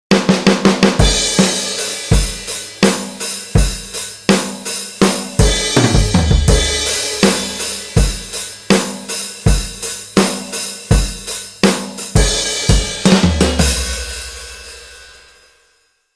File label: 70s UK Hard; KontaktPlayer